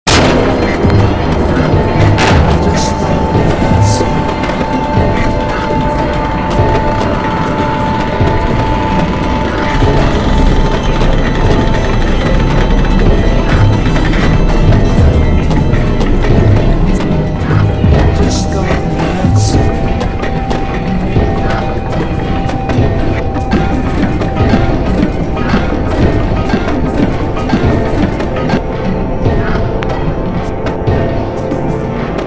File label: Physical Modeling